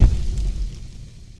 Fire Flare Up Poof, flares

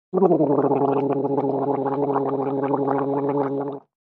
Male Gargling

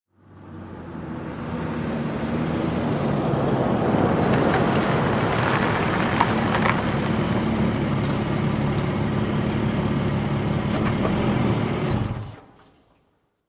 SUV Fwd Parking Manv

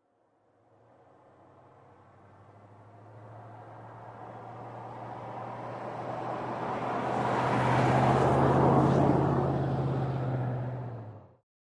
V8 Car Or Truck Smooth, maneuvers

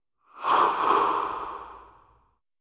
Football Bounce

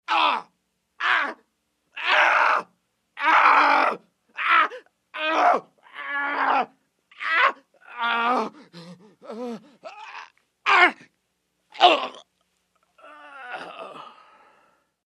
Male Horror Agony

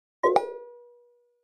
Pop Up Short Echoed

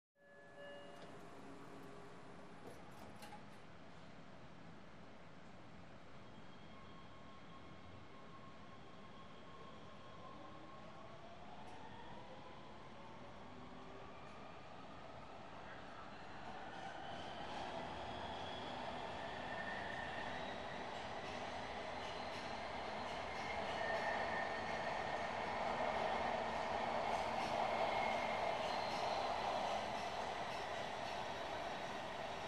Subway Train Accel

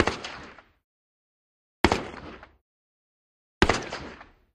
Russian SKS Single, sks 7x6

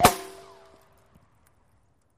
Bullet Ricochet Sin

